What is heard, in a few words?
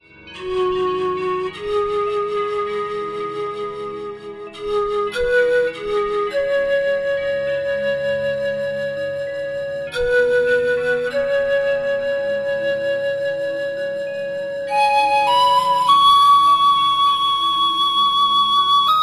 Paixiao Chinese Panpipe; Woodwinds; DAL Flute; Syntheway